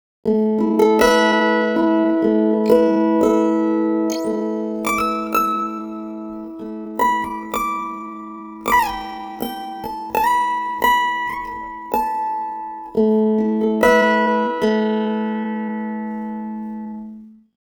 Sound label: pitchshift guitar, Native Instruments Kontakt 12